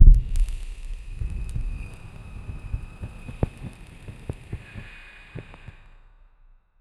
furnace approach with fir, metallic

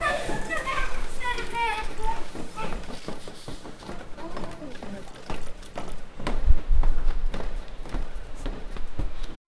people walking and talkin

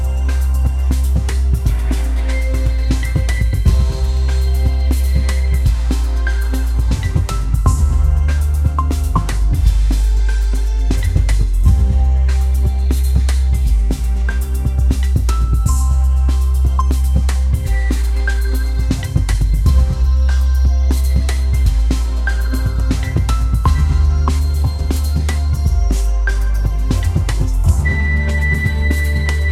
music, melody

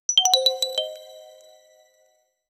generate a bright uplifti, reward

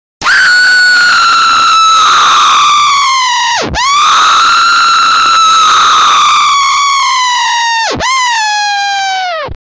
animatronic screamer; robotic

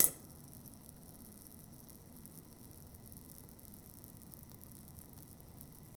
bubbling water rising and

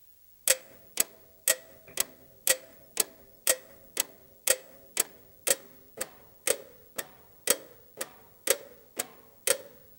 one mechanical antique ti, clockwork

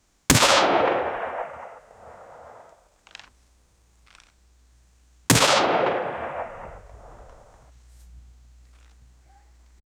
loud sharp shots of hunti, guns

